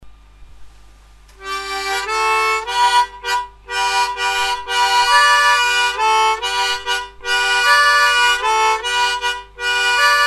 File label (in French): crapauds armonica